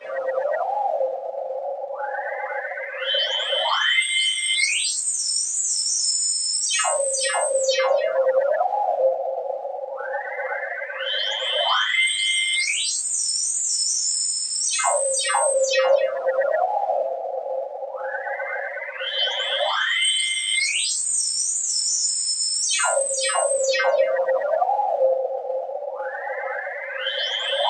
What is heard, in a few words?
Cinematic Tension Builder